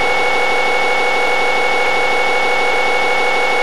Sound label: minigun empty; weapons